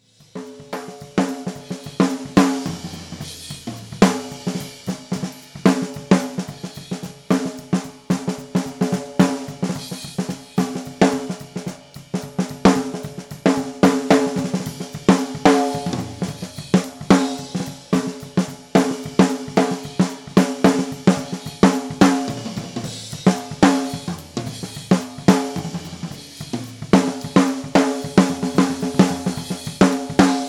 Drums SD Top

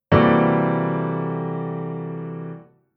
Chord Poly; Jissen

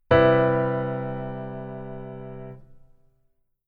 keyboard voicing

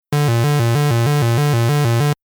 synth lfo square

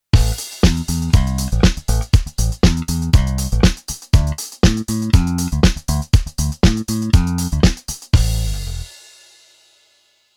Bass Disco

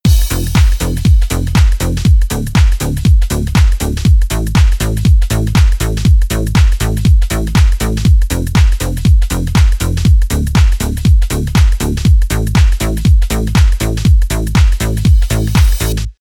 Synth Bass

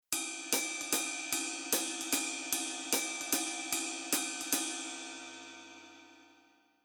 Rhythm Basic Velo HH Ride

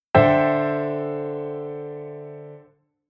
Chord Hybrid; Jissen

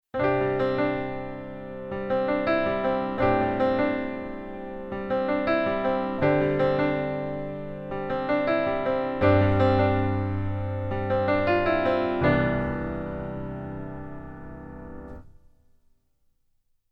Keyboard Pf Pops Pf

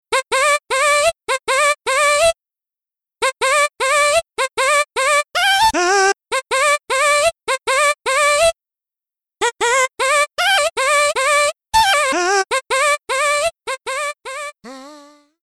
FutureBass Mix Melo Vo Chop

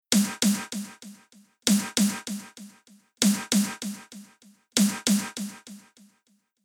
Delay Delay Time 300ms

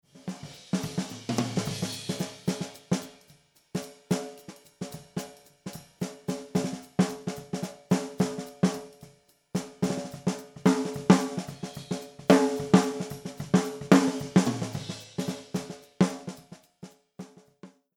Drums SD Exp

